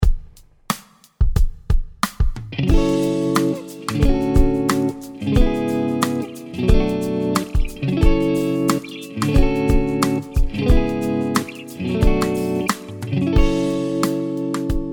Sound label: F Aeolian Tonal Center; guitars; key centers vs tonal centers